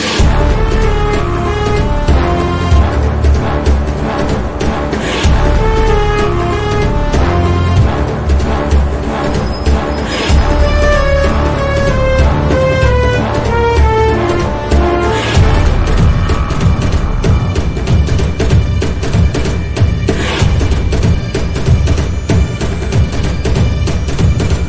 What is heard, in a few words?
theme music, ringtone; Pushpa; allu arjun